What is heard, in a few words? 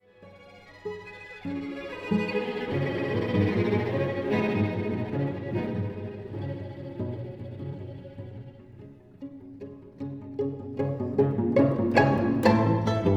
Ravel Quartet